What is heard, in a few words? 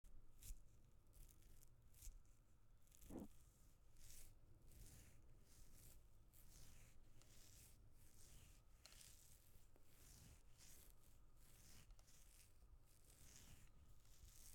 Beard Scratch